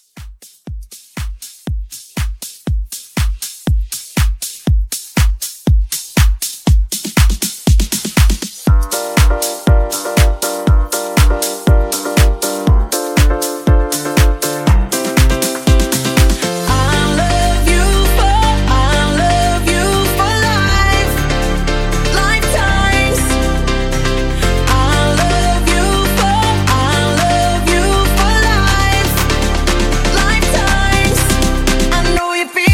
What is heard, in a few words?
Club Mixshow